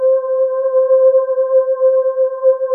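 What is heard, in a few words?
Synclavier vox; Rubber Chicken Software Sample Bank; TS-10 2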